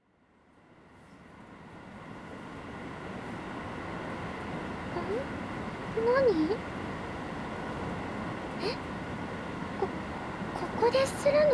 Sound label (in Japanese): 左耳フェラ／激しい／電車内; フェラ素材